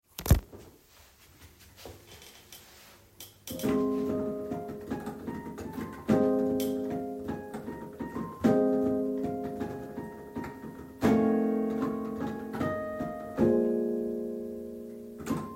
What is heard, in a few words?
scale mode